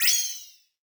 petal get

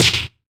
player hit

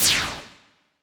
ui next

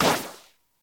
bubble hit

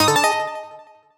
appear

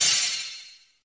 sentinel shot

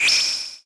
full energy

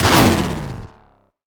bike start

